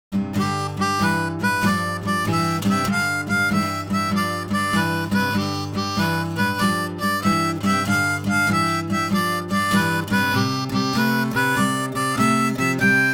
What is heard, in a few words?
Cross Harp Boogie, harmonica